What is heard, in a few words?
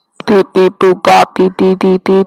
beep